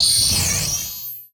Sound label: Multimedia Internet CD-Rom Flash Hi-Tech